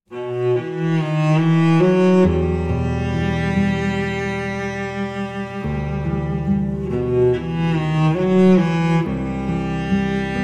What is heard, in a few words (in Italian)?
violoncello e chitarra